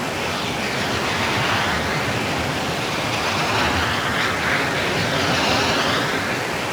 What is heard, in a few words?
rocket engine loop; Rust